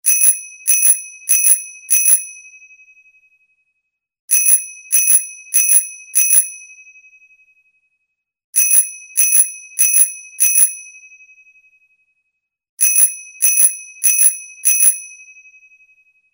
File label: Bike bell; Asus Nuvifone-M10